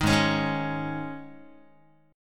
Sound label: G sharp Suspended 4th Guitar chord, down; G#sus4 strummed; JGuitar